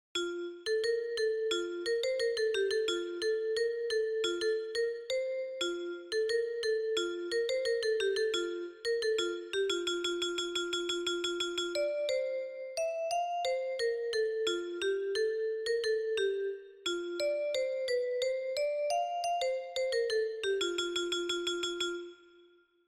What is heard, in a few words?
Vibraphone; 吹奏楽; R6 吹奏楽部 卒部式